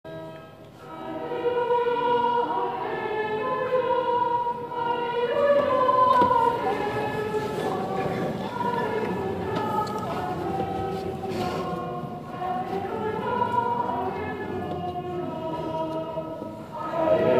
MESSE ALLELUIA; HENRI VI

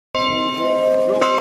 CLOCHE; LE VERT 79

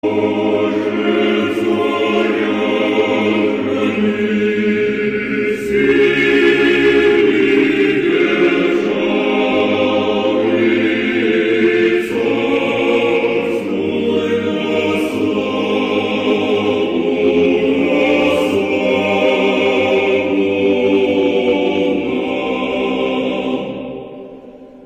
HYMNE IMPERIAL RUSSE

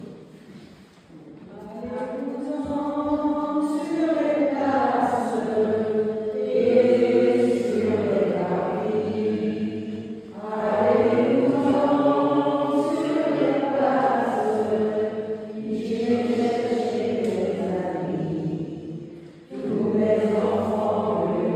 CHANT D ENVOI ALLEZ VOUS EN SUR LES PLACES; LUSSERAY

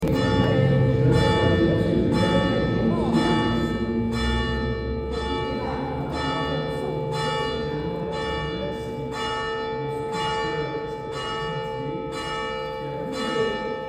CLOCHE, EGLISE SAINT PIERRE; CHEY 79

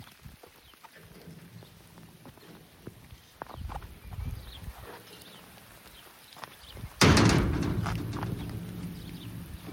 BRUIT PORTAIL SORTIE; VILLIERS SUR CHIZE 79

